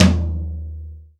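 acoustic tom drum single hit e key, Royality free tome drum sample tuned to the E note. Loudest frequency: 1001Hz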